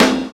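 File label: PERCU RIDE; Univers Sons - Kitch 70s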